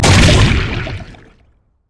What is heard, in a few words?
bounce large; LoL; zac base